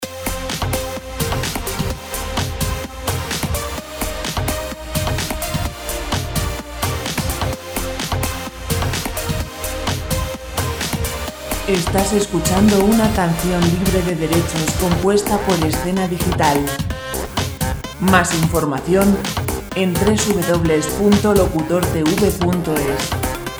128 dance

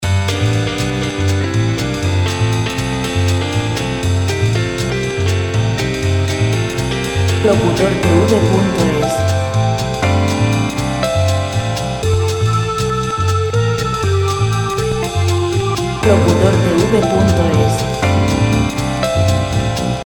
120 jazz rock; rock musica libre

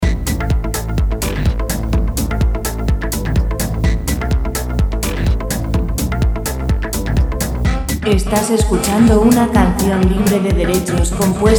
126 dance

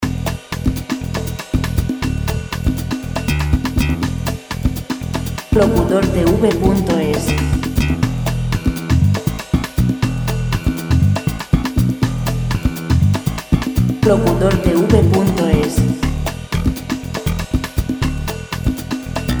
120 bass pop; musica television

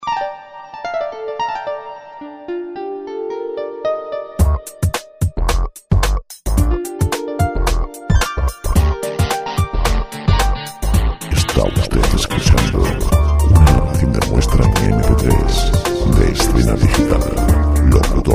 jazz fusion

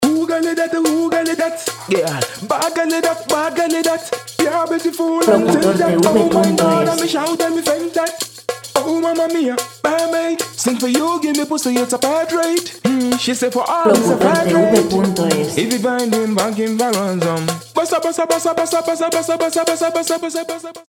110 folk vocal; Música vocal sin copyright

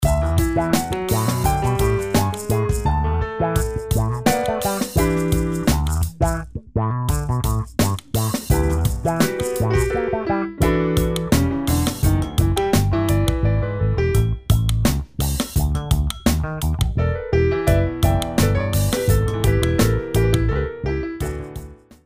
85E jazz; jazz music

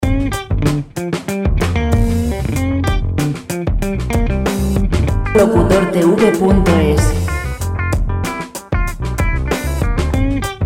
95 rock

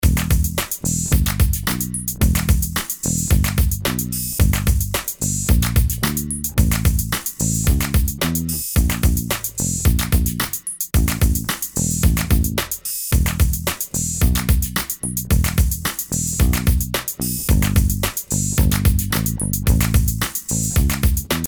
bass royaltyfreemusic; background music

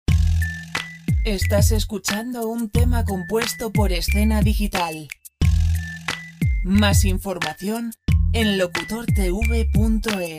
chinese rapid medio, musica folk music

